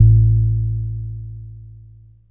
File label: lowdub, synths; Notes